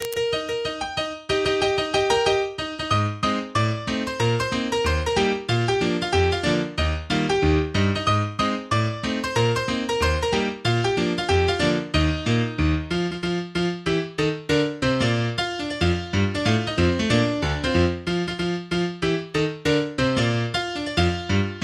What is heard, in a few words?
American Swing